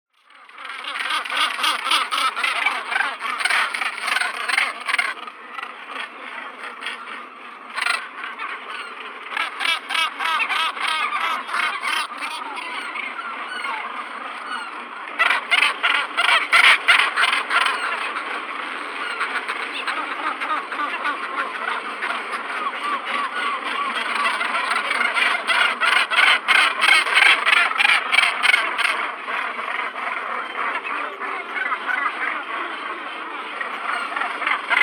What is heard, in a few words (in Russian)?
olusha severnaya morus bassanus